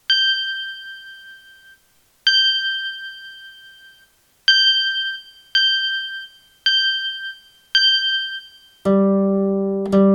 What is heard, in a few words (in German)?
Ping Pong, Zither; Playalong; Neue Zitherschule